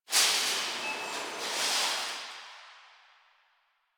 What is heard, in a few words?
Grocery Cart One Off; Warframe; Ambience; Nineteen Ninety Nine; DE